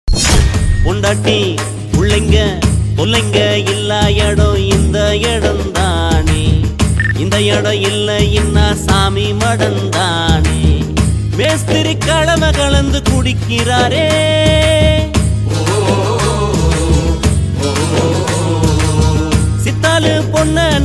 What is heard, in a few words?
sad tamil; karaikudi